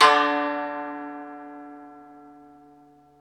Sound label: SU GZHENG, Earth Tones